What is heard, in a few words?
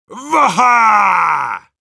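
cheers, Russian; Heavy; «Воха!»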